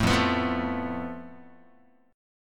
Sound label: F Major Flat 5th Guitar, chord; strummed; 4A,4E,5A,5D,5Gsharp,6Csharp; 0,1,2,3,1,x; JGuitar